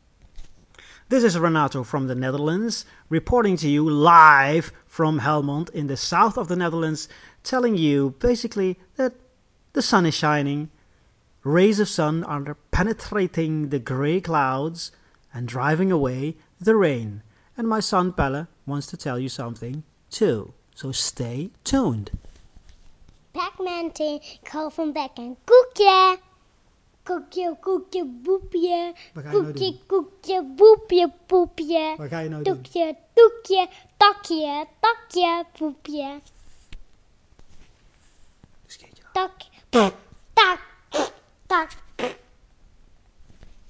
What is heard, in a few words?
my 4 year old son babbling away